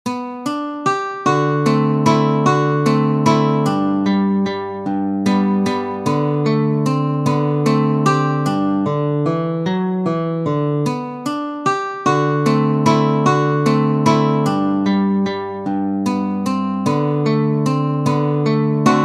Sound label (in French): simple melodie